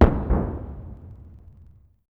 distant gun 105mm howitser; WAR

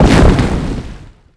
destroy boom; WAR

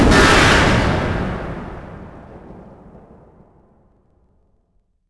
distant tank destroyed; WAR

